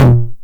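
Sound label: 909 low tom, tape saturation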